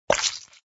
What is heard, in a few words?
Organs; Cooking Mama Peta version